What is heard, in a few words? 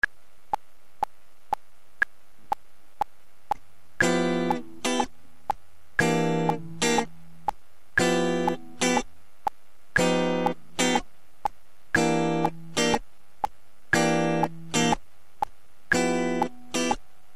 Spiced Up Blues, guitar